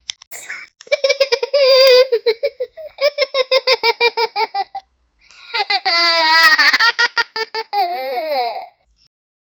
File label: child laughing